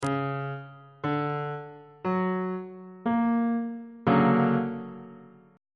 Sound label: c double sharp augmented major 7th 3rd inversion on bass clef